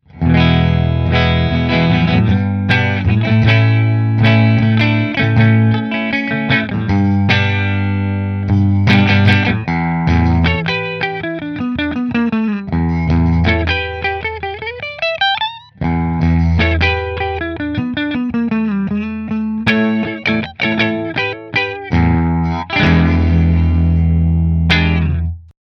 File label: Aria Pro Middle Fender